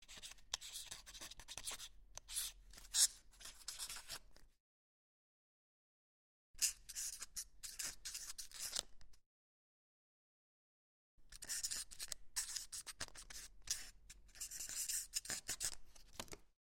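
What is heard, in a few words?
sounds of a quill pen and inkwell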